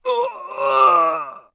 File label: scav death, voice; Escape from Tarkov; scav3